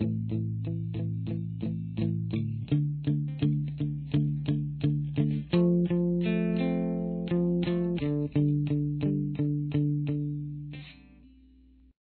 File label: intro acoustic, guitar